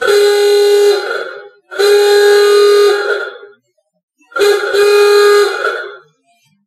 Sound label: Ahooga